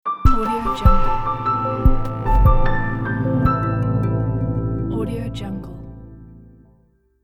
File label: lounge intro logo